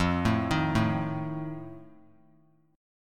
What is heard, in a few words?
F 7th F down; F7 arpeggiated